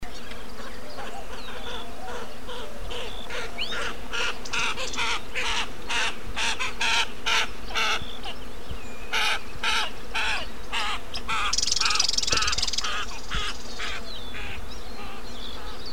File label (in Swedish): blavingadarta